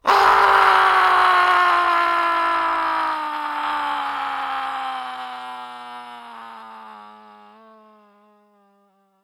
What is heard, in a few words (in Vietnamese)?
het cua nguoi roi xuong vach da